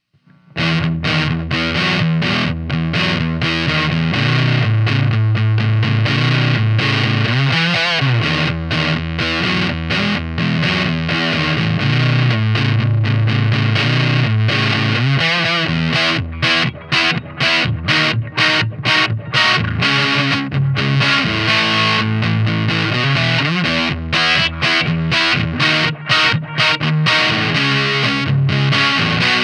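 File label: GA10 SC64 Overdrive Rhythm, eminence; Josh Smith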